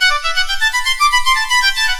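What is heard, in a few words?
Sequence Pattern, Keyboards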